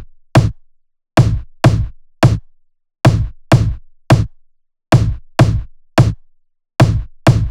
Loops 128BPM, Drumloops

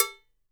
COW MU; Sampleheads; NYC Percussionworks; 90 s Sample CDs

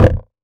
Dup, Kik, One Shots; Sonic Foundry (Sony Creative Software) - Future Retro Dance Excursions

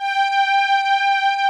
BRIGHT ST G4, SYN STR

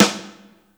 CROSSTICK, snares; InVision Interactive; Lightware VOL-1

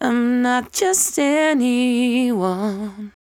74-5bpm B, Velvet Vocal; While U Could; Zero-G

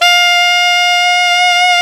sax alto mf; Alto 414

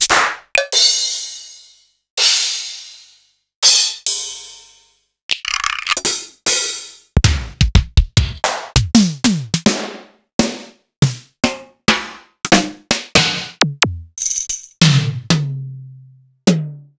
Akai MPC500 XR10

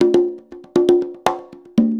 120 CONGAS, Percussion Loops; USB Soundscan vol.36; AKAI

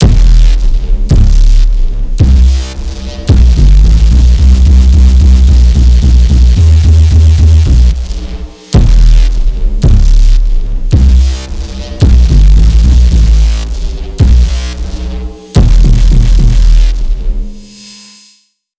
Instrument Layer, bass

